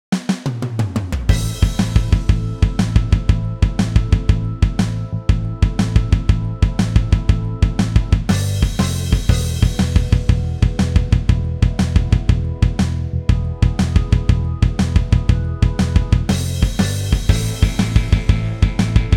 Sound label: JamTrack